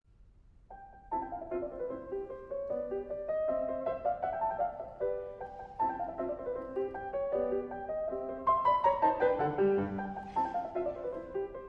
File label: Piano Sonata in C Major Rondo Presto; Hob XVI48